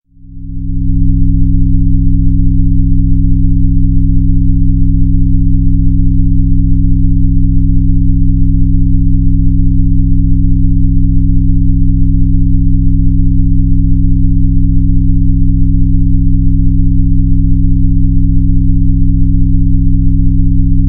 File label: Brainwave Enhancer Gamma; Heightened Perception